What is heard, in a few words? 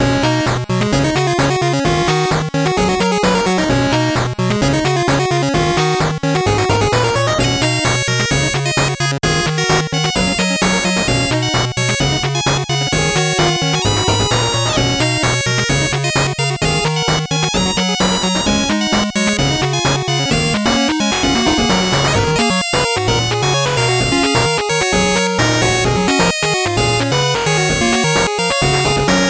8bit bgm slow